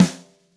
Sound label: Gretsch Snare; 1967 Gretsch Kit; One-Shots; Sonic Foundry (Sony Creative Software); Vital Drums